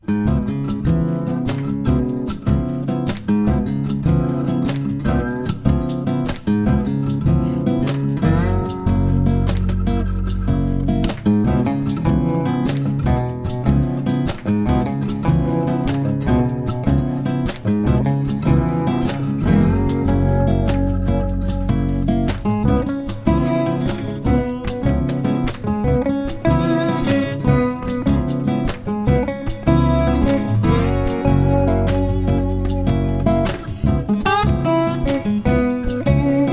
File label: Blues